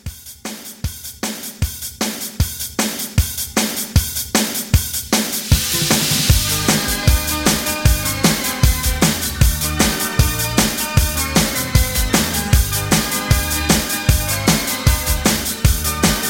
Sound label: 80s Rock Re Drum 154